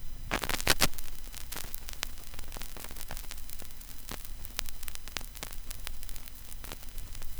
vinyl crackle; 99Sounds; Vinyl Noise SFX

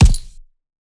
Punching Perc, Straight Glove (Chain); 99Sounds; Punching Percussion

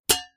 hit Helmet, Swords; Foley; Kenney's Sound Pack

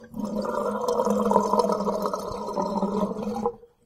sink Drain; Foley; Kenney's Sound Pack

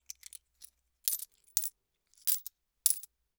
Money, Coins, Hand, Count; Hzandbits

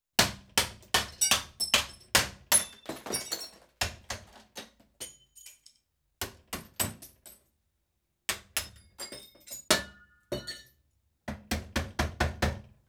Glass Hammered From Smashed Front; Construction; BBC Sound Effects Library

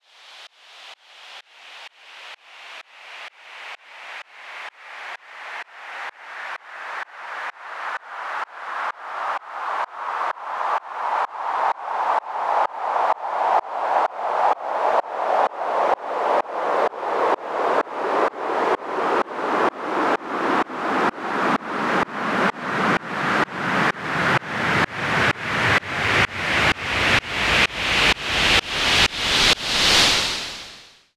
Long Sweep, White Noise

